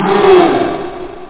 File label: grr; Anim Amiga Legacy; Transcription: / Mmm